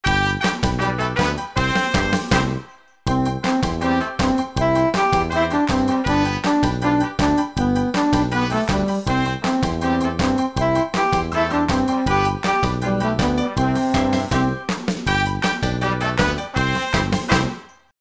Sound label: Backing track; Birralii Wii dha; yaama gamilaraay; maabu numbers and numeracy